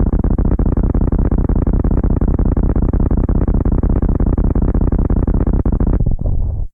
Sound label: Sm Explosion